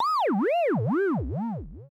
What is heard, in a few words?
hero death; pixelsphere